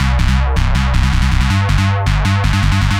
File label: Z Bee 160 C, future rave samples, 160bpm